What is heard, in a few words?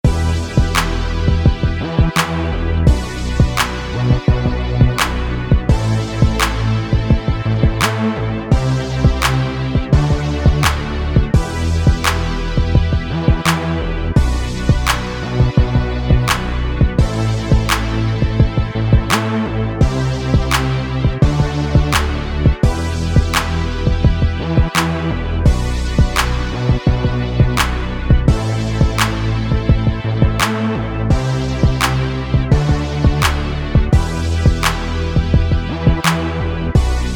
Instrumental